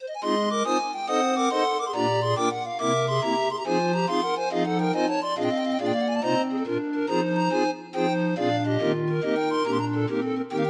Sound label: 31 note, Grind Organ